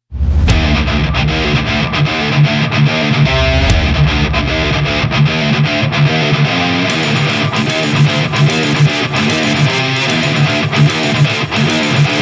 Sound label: Agressive Metal; Down to Hell